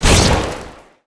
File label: Die; ElfKnightSoul